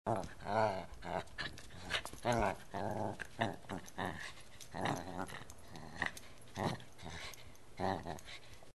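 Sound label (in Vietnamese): chú chó đang chơi với đồ chơi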